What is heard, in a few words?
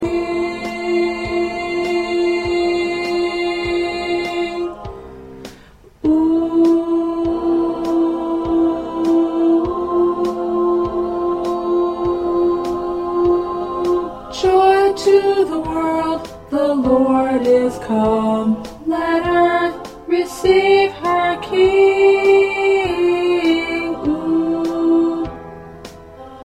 2nd Alto, choir; christmas songs for choir; part preview